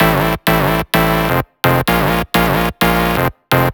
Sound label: Melody Kits 128BPM Root A; Vengeance Samples